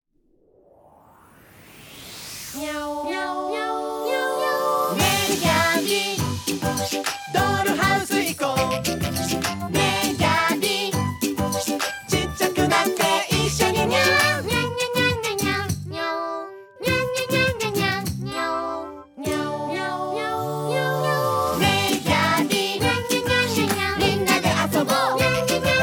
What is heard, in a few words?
bg music